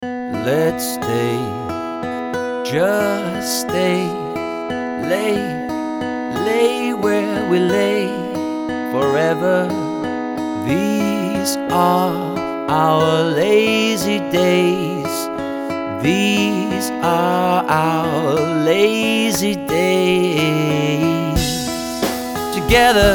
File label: Vocal Attack